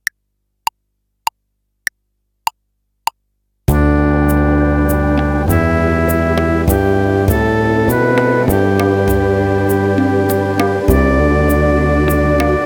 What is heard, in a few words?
Calm Crossing, fife